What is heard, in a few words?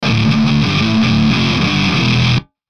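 Musical Element, Drum Snare March; Blastwave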